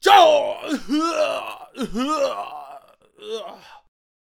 Super Boxer Death Vocals

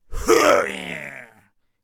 Tempest Lich Attack Vocals

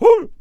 Bard Attack Vocals

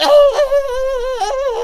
Snake Archer Attack Vocals

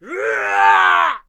Shield Bearer Attack Vocals

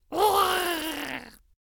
Scarecrow Death Vocals

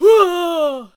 Harvester Attack Vocals

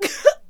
Death Vocals; Radiant Glaive

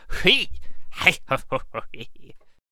Attack Vocals

